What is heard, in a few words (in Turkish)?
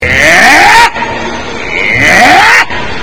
ultra bassli